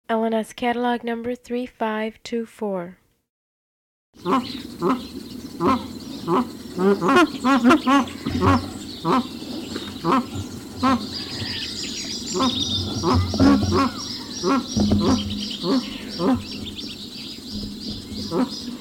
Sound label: magpie goose